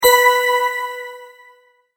multimedia tone correct

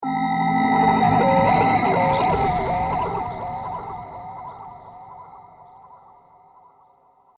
An Alien Jungle World

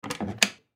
Ship Bed Wood Cabinet Under Bed Open; Transportation Watercraft

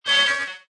Digital Feedback Swell, Scifi; Scifi Production Elements, Set 2

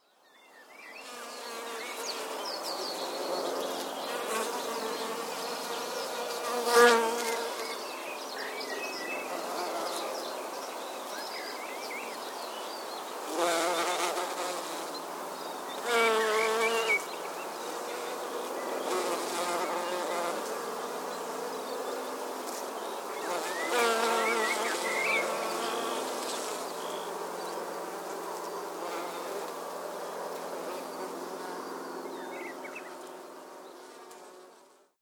English Countryside Birds And Bees; insect; outside ambience; Insect Sound Effects

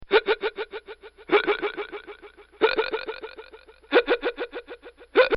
Hiccups, baby; Voice; Babies; Bobby Cole; recorded in a custom built sound booth

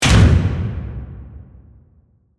Hit Slam Reverb; Production Element, Imaging Element, Accent, Transition

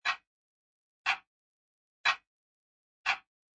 Grandfather Clock Tick Tock Loop

